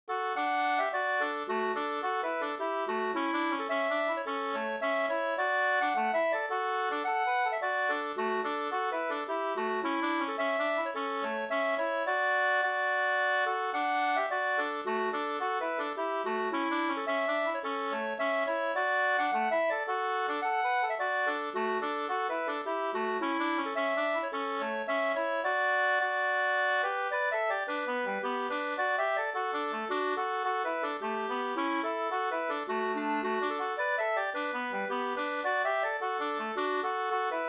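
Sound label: polska, two-part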